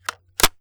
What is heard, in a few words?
Firearm AR15 Insert Magazine; Firearms; Zomboid